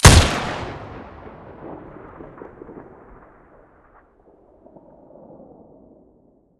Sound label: wa2000 muzzle stereo